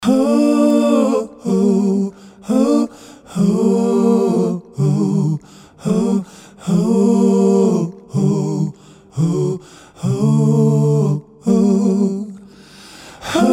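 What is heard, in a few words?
backing vocals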